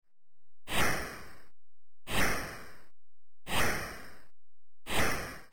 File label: cannon